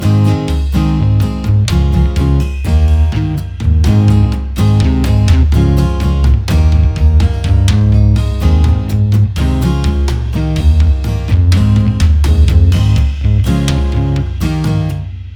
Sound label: Race Winner Loop; Timberman VS; ps4